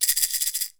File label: PEYOTE, PERC; AKAI S6000 CD-ROM - WorldSounds